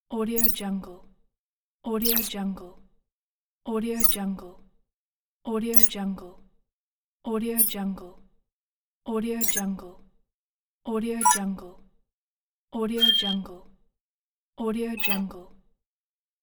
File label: digital sound effects pack; evdokim-music